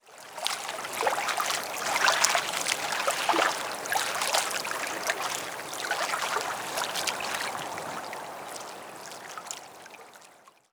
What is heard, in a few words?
environment water quiet docks